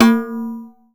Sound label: Blimp; Zero-G; Soundclash; Acidized Wavs